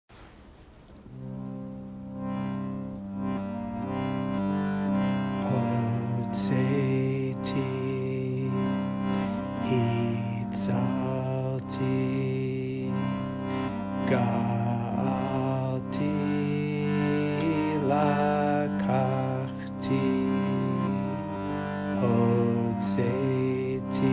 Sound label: chant; hotzeiti